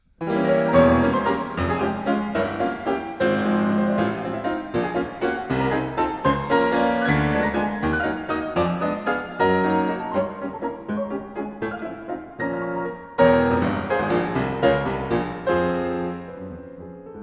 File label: Sonate Scherzo Allegro energico; Francois Frederic Guy; Brahms; Sonate No3